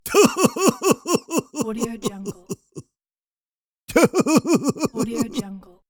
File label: goofy laugh; cartoon